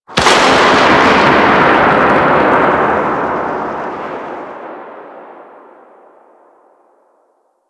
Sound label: Shipyards Thunder; Warframe; Grineer Shipyards